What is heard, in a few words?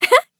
tracer laugh; tf